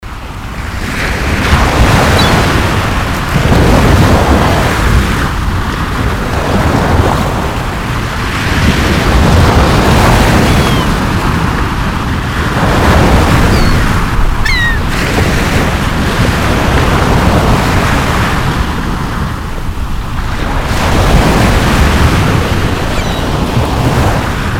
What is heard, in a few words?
sea